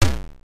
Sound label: snowball hit